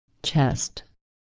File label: chest